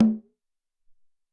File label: Doumbek